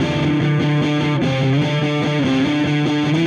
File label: Dirty Chunk Guitar, Indie Rock; Silos; Sonic Foundry (Sony Creative Software); Indie Rock Anthems; Session 11 - Silos